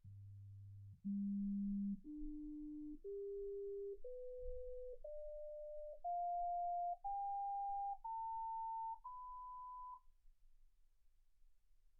sinus tones 48kHz

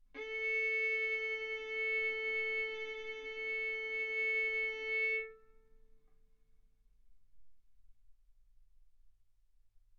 Violin arco mf sul A A4 B4 stereo; single mic

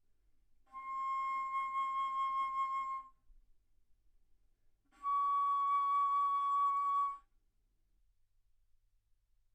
Bass Flute C6 Db6; ff

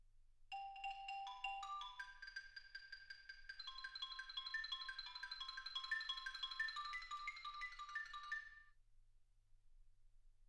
xylophone

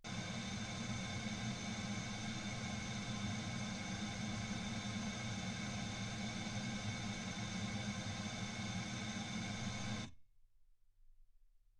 pink noise 48kHz

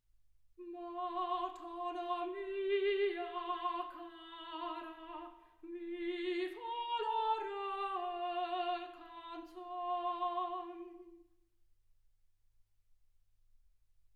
soprano

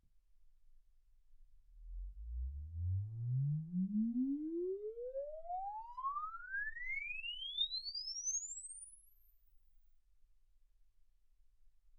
exp swept sinus 10Hz 24kHz

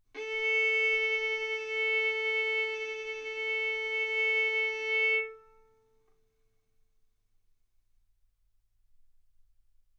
Violin arco A4 B4; mf; sul A; stereo

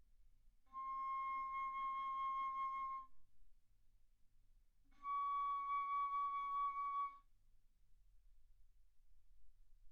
Bass Flute C6 Db6; ff; single mic

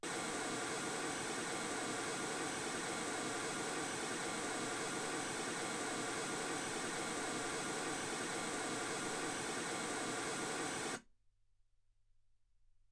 mls16 48kHz

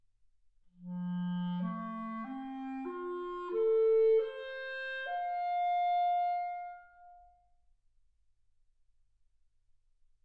clarinet

